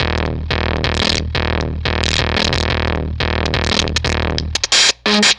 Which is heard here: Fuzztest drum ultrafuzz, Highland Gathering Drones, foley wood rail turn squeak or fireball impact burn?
Fuzztest drum ultrafuzz